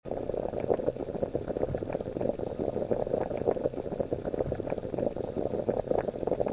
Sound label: Boiling water bubbles loopable; Cooking